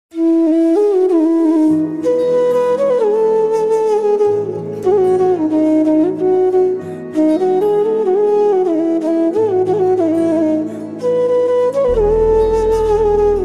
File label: flute ringtone; brahmãstra